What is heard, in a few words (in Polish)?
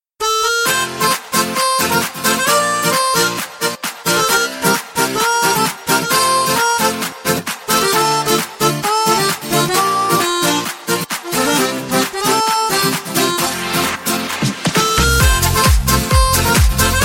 GITARA DROPS; MASPETH